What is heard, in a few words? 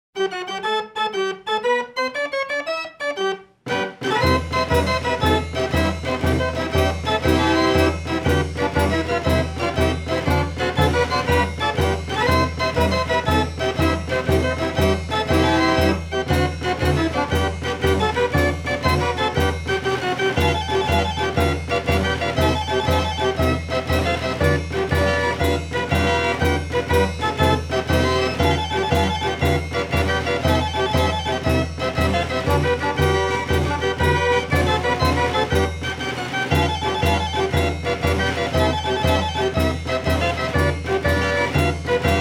Wurlitzer Carousel Organ